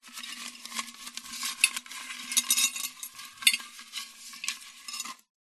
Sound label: money notes few coins movement inside glass jar; foley; zapsplat